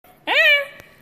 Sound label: bestie noises